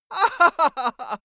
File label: male laugh bright